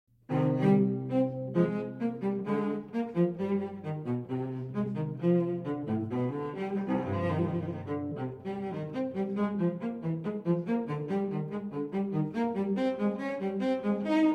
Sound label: Cello Suite in C Major Bourrées I & II; Cello Suite No. 3 in C Major, BWV 1009